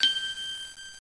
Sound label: beep